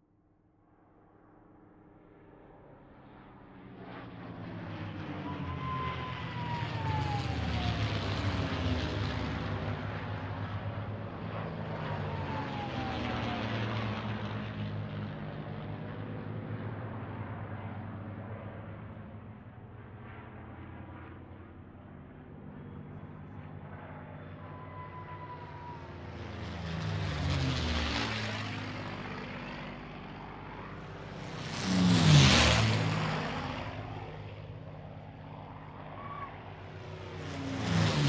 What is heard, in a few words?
warbirds